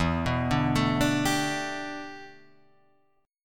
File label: G 6th Add 9th E, chord